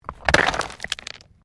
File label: STONES MOVE